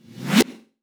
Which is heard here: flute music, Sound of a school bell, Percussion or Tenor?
Percussion